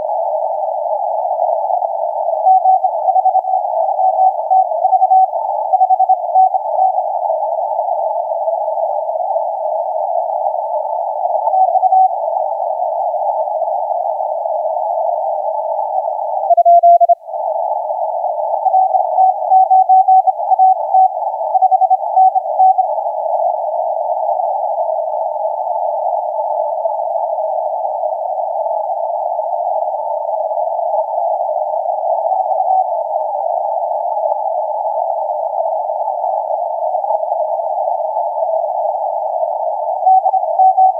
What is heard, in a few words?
20m; TF